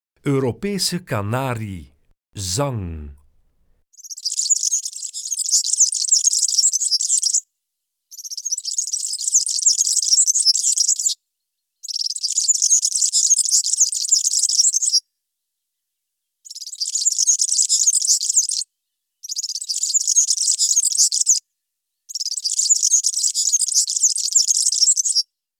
Europese kanarie, Zangvogels; IVN

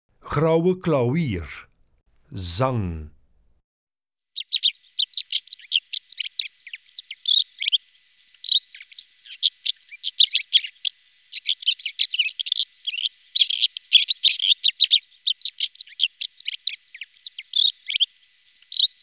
Grauwe klauwier, Zangvogels; IVN

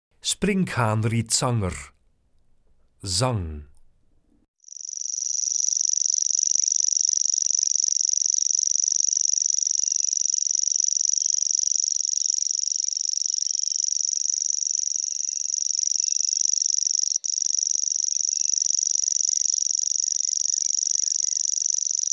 Sprinkhaanrietzanger, Zangvogels; IVN

